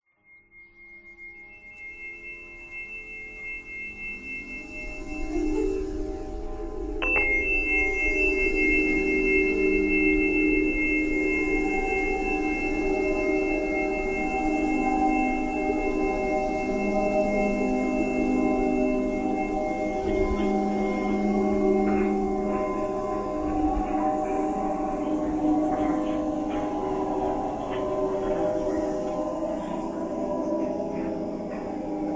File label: breathing noise